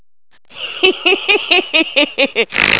snarf

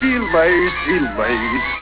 delays

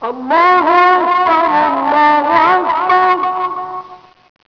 azan

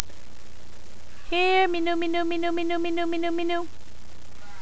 catcall

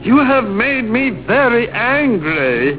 angry; "You have made me very angry!"

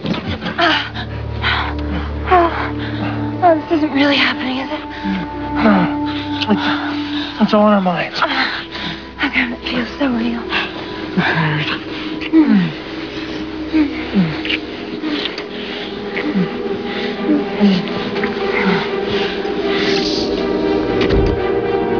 kiss

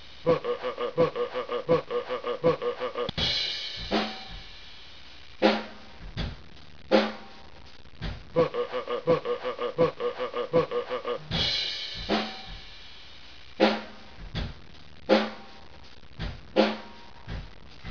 beatbox mix

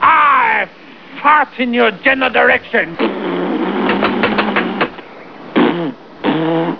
fart

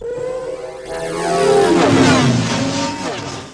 Bikes

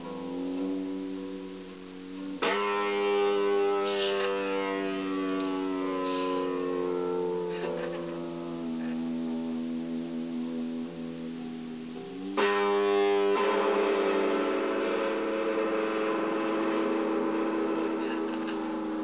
wail

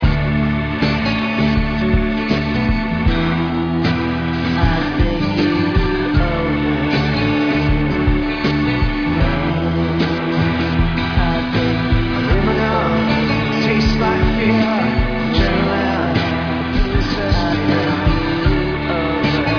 ebow

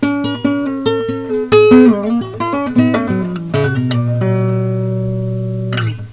blues

